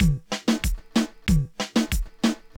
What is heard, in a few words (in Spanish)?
Dembow Reggaeton sin Procesamiento; dembow de reggaeton reparado flp mixing and mastering